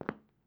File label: step tile soft